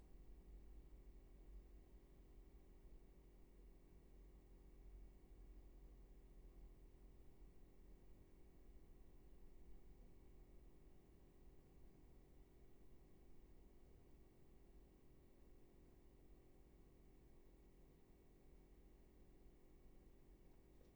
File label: room tone; Pasterkamp